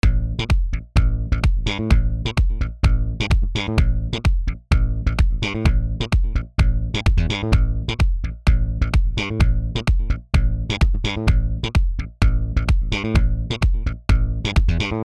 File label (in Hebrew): לפני eq; שירה נשמעת חנוקה? תוסיפו לה קצת תדרים גבוהים באזור ה-3kHz ותראו איך היא מתעוררת לחיים.; טיפים מהסטודיו 3 אקולייזר eq ומיקס בסיסי